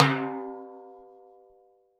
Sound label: Timbale Low Shot